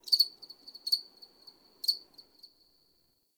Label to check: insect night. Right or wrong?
Right